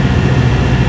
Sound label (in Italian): Veicolo, motori